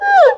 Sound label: legato slide glissando, erhu; playing tech